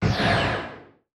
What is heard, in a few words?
distance laser turrent fire; weapons; Stellaris